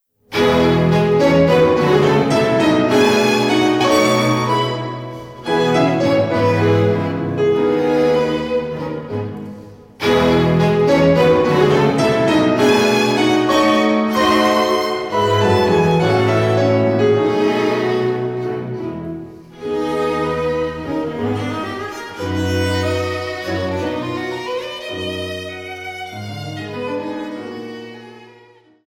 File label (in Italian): Quintetto per pianoforte, due violini, viola e violoncello Allegro deciso; Alessandro Longo